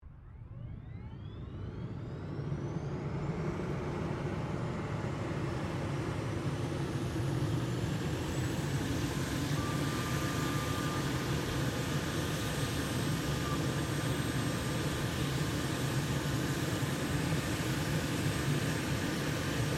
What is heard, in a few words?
helicopter Sikorsky UH60 Blackhawk engine startup; Vehicles; Aircraft Collection